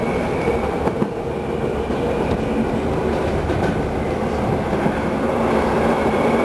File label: Motor, Train; RBDe560